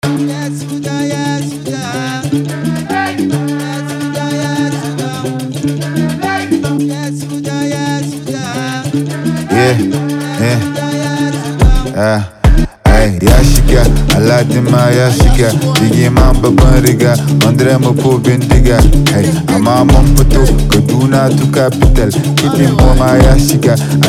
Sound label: beat